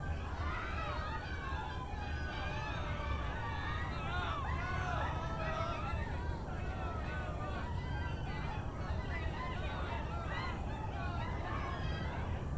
Ambiance Expo Intro

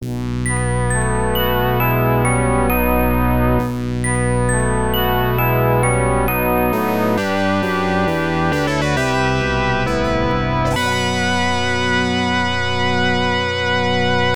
Game Clear; strider hiryu; x68000

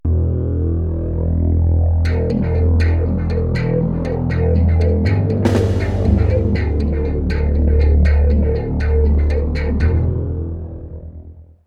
Rock Throwing; Yakuza

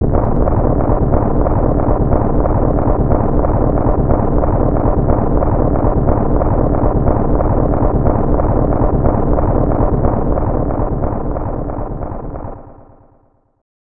Castle Collapses; super zugan; snes; Hakoten

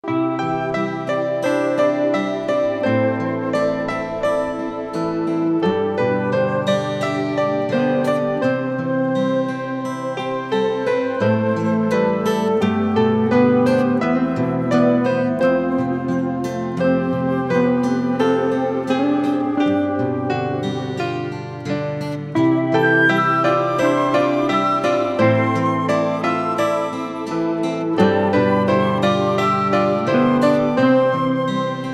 song in house music; Octodad Dadliest Catch

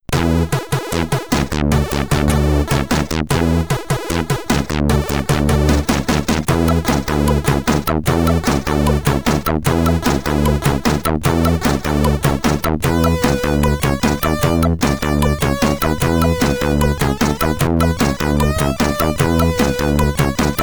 BGM, SID Stereo, Commodore 64

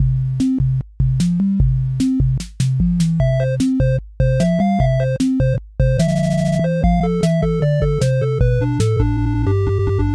Ambience 8-Bit; Five Nights at Freddys; FNAF Special Delivery; android; Turtle Crusher

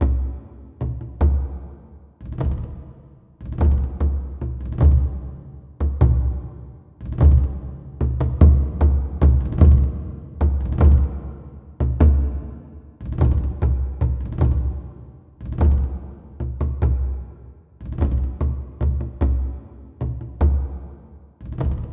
Low Drums

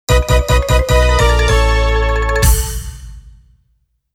Mission Failed

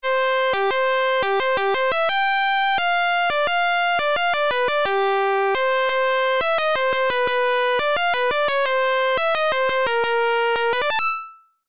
Violin; Doukyusei; FM Towns

